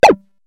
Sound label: exhale